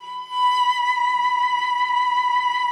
B5, cello vib; Xsample VOL-7

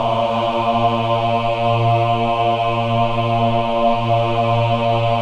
MEN AH, Choir of Ahs; Roland - LA Composer vol. 1

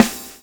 SWNG

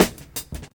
REAL, DRUM LOOP; Ueberschall; Jam Box

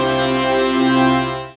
SYN DANCE; Sound & Vision - Gigapack II

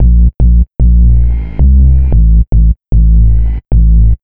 Bassline; Drums & Drones

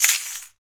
RAKATA, Afro Shakers, Ankle Pods; Roland LCDP14 Africa VOL-2

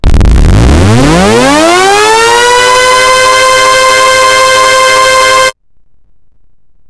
Distorted Lifter; AMG - Prototype Drum & Bass

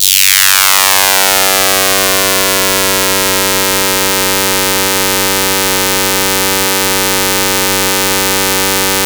LFO Impact, Analog; Industrial; LFO FX

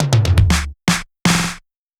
Fill, Drums, Eclectic Electronica

